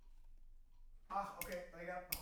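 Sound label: guitar Mic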